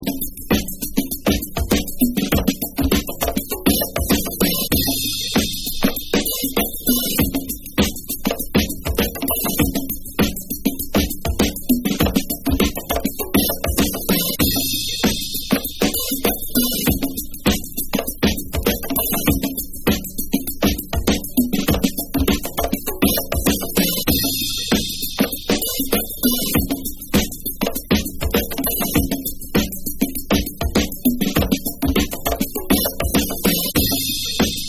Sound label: RICH DRUM BEAT Drum